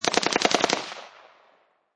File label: Machine Gun Colt Ar-15 Long Burst Distant Range